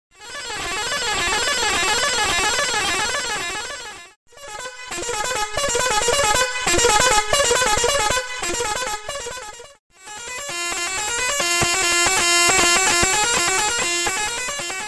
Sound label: tenor horn 16 in 1, Hexatone